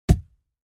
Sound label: Wood Into Ground; Hollywood Edge Martial Arts and Human Impacts